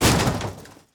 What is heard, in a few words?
wooden door hit; wardrobe